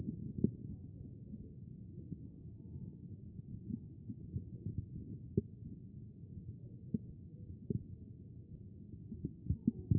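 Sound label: anterior chest, position 4; B MODE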